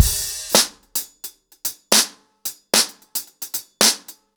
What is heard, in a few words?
110BPM, acoustic drums, drumloops; The Roots Drumloops; Zero To Ten